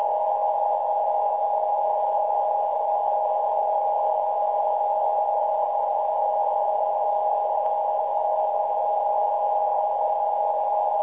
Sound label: websdr recording 433.5kHz